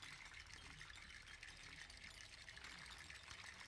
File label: spin loop; rise of maya